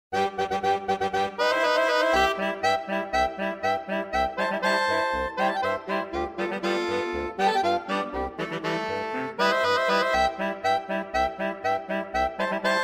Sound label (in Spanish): Cuarteto de Saxofones; Las Corsarias arreglado para Cuarteto de Saxofones